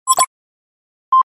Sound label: computer interface; radio signals